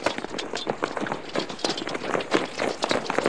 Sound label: movement